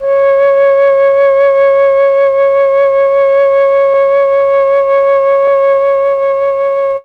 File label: flute; ILIO; Propeller Island Legendary M400